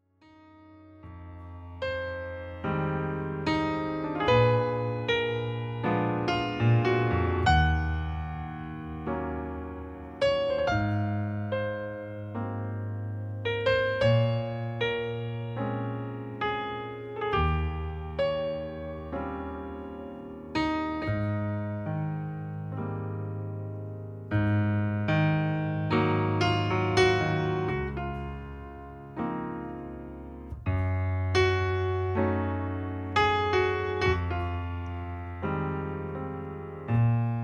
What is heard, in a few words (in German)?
Solopiano